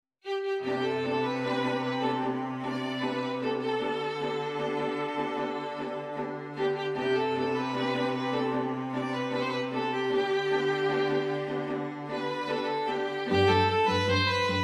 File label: STRING QUINTET, indian music